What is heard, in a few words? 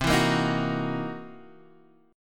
C 7th Sharp 9th Flat 5th Guitar Open D